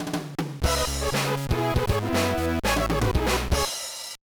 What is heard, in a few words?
Jingle; ultimate muscle the kinnikuman legacy the path of the superhero 2002 gba